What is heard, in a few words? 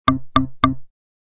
Message, Nokia Alert tones; Nokia 1006